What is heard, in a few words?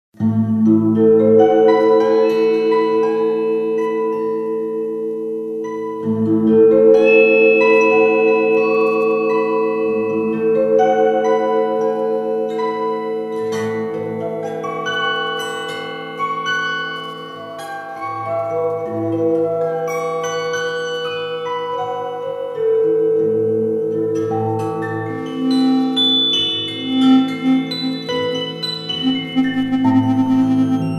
Nice Noise Book